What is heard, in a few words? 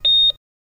Medlab EKG Beep, electrocardiogram; ekg or ecg electrocardiogram